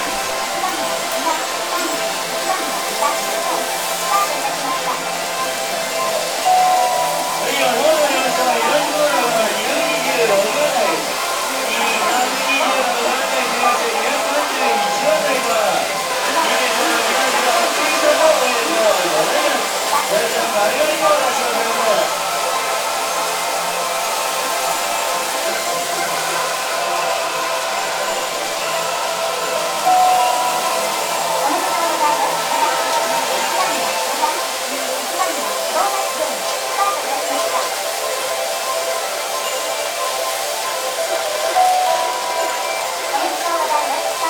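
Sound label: pachinko japan, game hall; best service Blue Box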